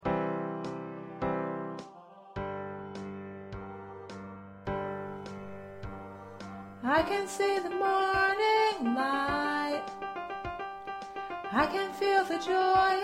Lead

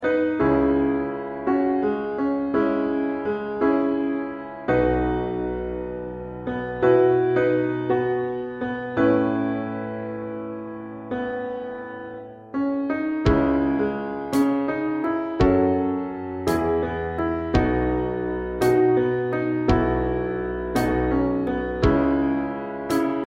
Piano Drum

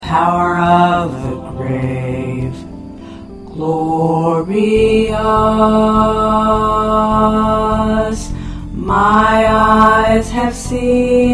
Baritone

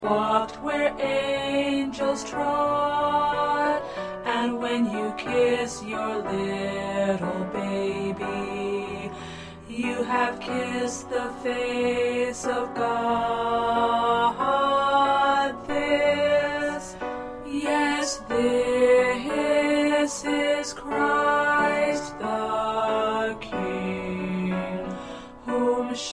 Tenor, choir

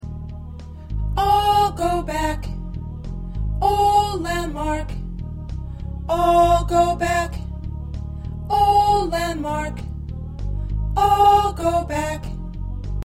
Alto

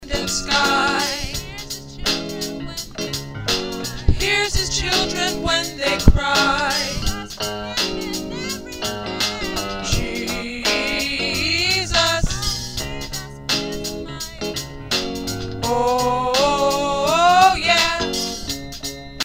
Tenor

